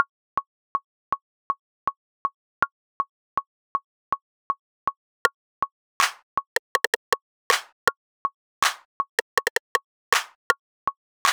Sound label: click, backing tracks